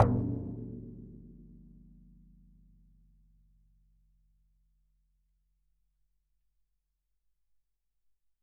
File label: Metamembrane 10cm inside